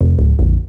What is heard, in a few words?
Hit Fail; Freestyle